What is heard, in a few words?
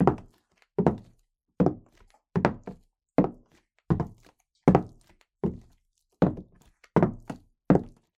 Footsteps Female - Stilleto Heels Wood Floor Slow